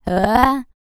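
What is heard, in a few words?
"oo", female human, vocal; ILIO; Vocal Planet; R&B & Dance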